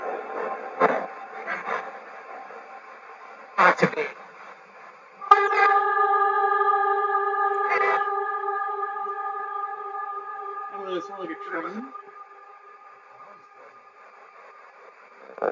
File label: Library Spirit Box